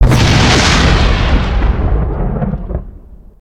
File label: ship explosion; destructibles